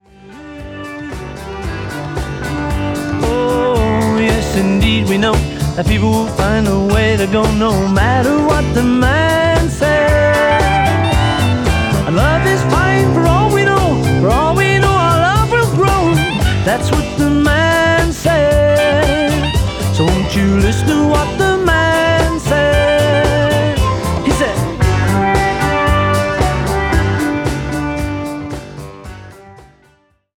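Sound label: pops men laser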